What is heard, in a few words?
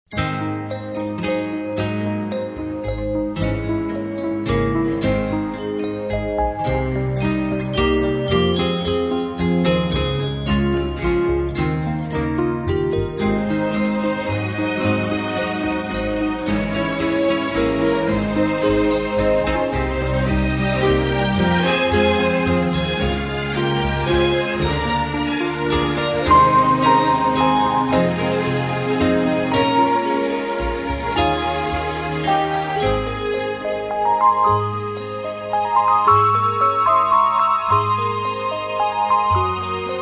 Orchestra, Strings